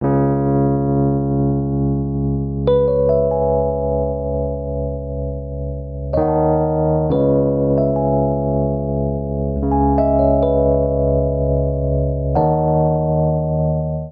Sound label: Progression Melancholic Minor Db Minor - Eb Minor7b5 68 bpm, Electric Keyboards; Ableton; Factory Packs